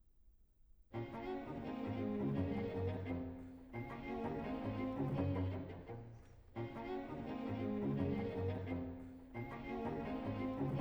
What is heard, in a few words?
string quartet in b flat major presto; op 130